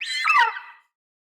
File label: scream; CrossCode; battle; vulturbine